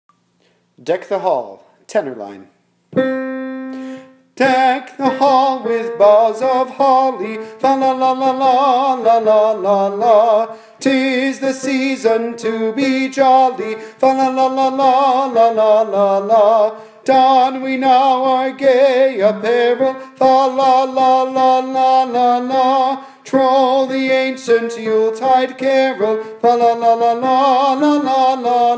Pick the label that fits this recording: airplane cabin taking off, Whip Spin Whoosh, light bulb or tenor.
tenor